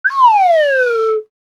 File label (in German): Lotosfloete down